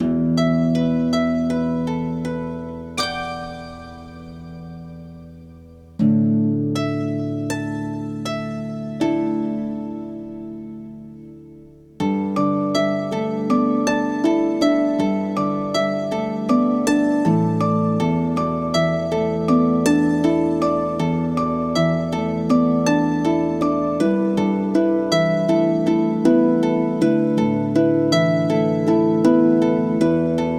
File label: Atmospheric, Hybrid Harp; Toontrack Atmospheric EZkeys MIDI